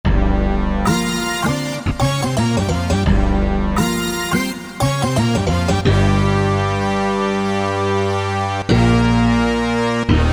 Brass bass

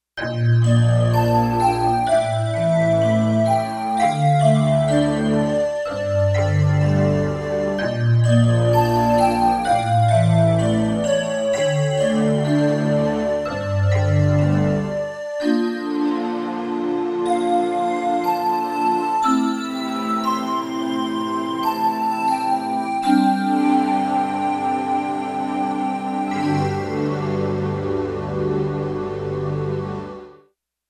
Glocken flute; Digital Synthesizer

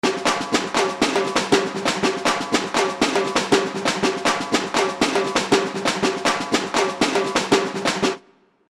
Cxa Tmbl, Latin; World; Roland SR-JV80-18 World Collection Latin

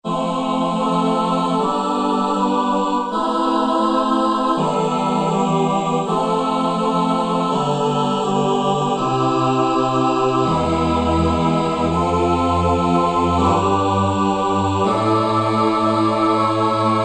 Ch. Aahs 2, XG Voice examples

